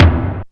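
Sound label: DRUMTRAKS low tom, Drums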